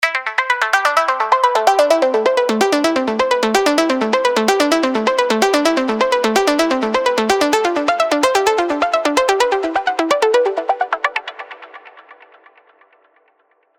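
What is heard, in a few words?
arp, Broken Glass, Spire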